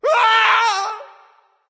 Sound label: fear; smite; thanatos